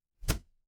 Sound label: impact slam